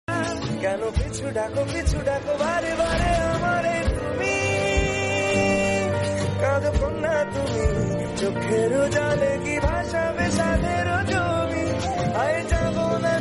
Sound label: romantic bengali